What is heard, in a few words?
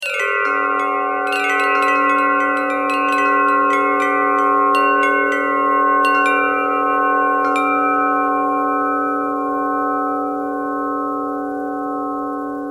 bell thread buzz